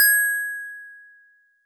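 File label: Metal Tube DX100 G sharp 1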